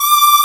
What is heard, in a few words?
HOLLOW HI, synths & keys; E-MU Formula 4000 Series Vol. 2